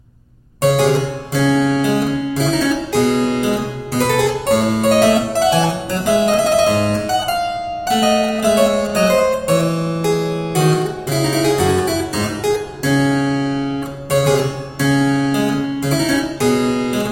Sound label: Ouverture Burlesque Loure, Harpsichord; Georg Philip Telemann; Der Getreue Music Meister